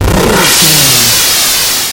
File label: retro spaceship engine